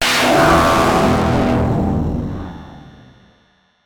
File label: Impact Static Blast; bottlerocket